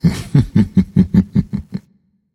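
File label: "heh heh", nonverbal; smite; khepri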